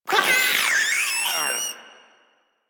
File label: Maggot Bark; Enemies; Vocals; Warframe; Proto Infested